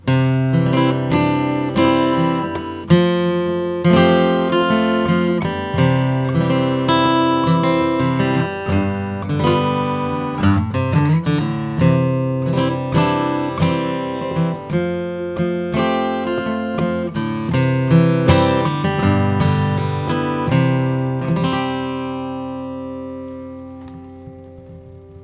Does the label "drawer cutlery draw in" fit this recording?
No